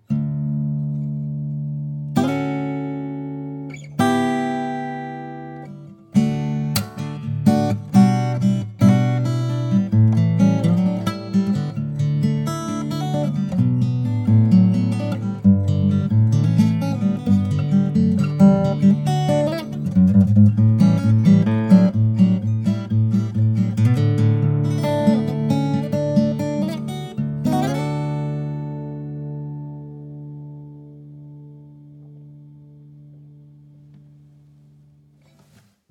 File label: Guitar